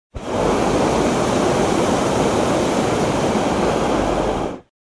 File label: Long air pump sound effect